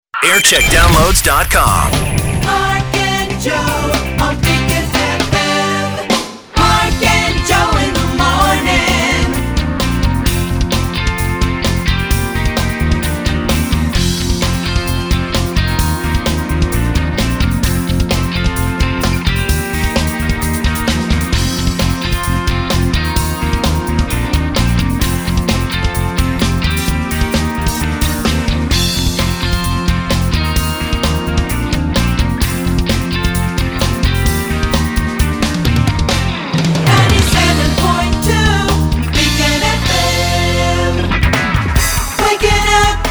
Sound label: jingle montage; beacon fm